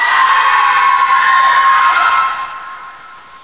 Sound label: asylum scream